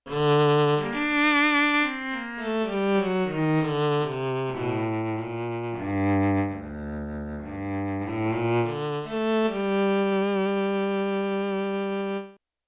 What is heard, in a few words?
Cello